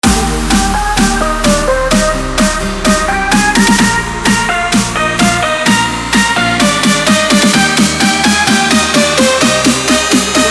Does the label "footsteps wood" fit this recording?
No